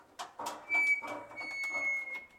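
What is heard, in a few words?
Wheel Rotation Creak; Wheel Rotat Creak 01; Puddle; puddle plus ios gamerip 2018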